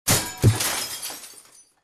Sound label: Window Shattering